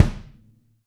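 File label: bass drum, Roland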